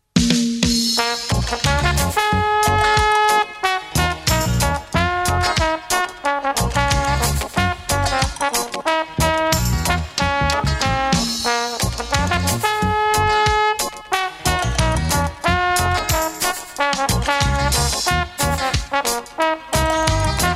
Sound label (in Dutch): Trumpet Reggae versie